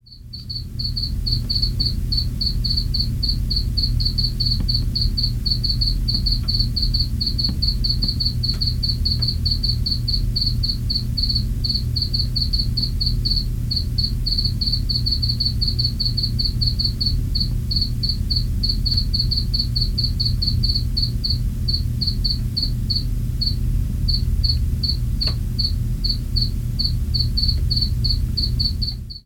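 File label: Crickets Low Rumble Background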